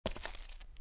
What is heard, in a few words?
Foot Tundra; Civ4 beyond sword